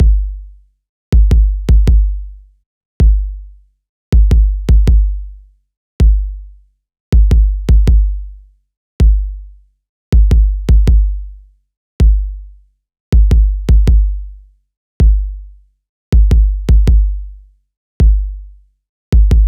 Kick

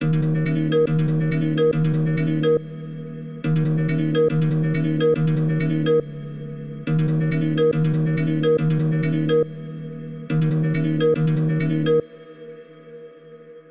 Synth Loop

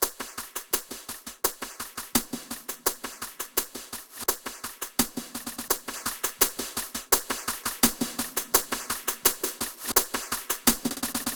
Perc L Shaker 169bpm; ShakerBaker

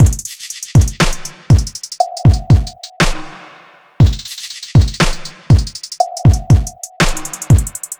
Fm Full Loop

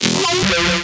Bass Hit E

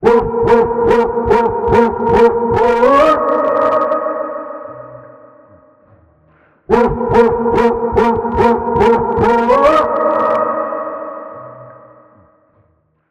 loop vocal 144

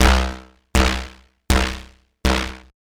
percussion loop boing 160

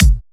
Kick

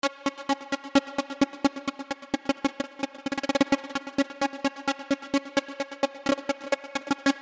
Hi-Hats

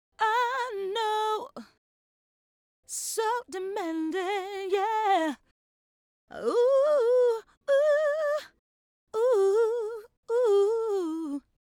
Gmaj Dmaj Female adlib

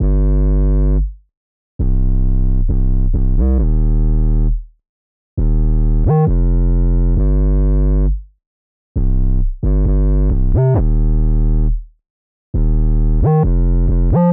808 134 Gm Dry

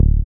Acid Syn Bass

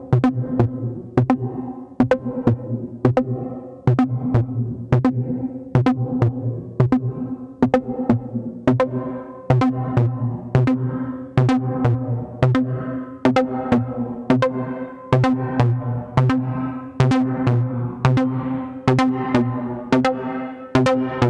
Chord Loop 128bpm Cmin

